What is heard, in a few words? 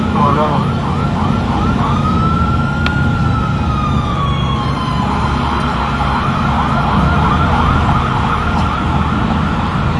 siren; Нарастающий звук полицейской (милицейской) сирены